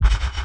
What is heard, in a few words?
Kick; BlissedOutBeats; Touch Loops; Drums